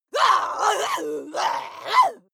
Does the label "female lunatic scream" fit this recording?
Yes